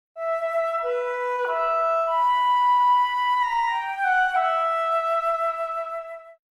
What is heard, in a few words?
flute phrase